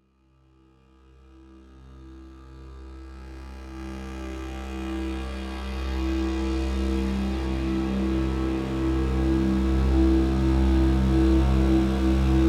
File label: meditation drone c; sound monk